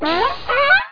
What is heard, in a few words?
seal open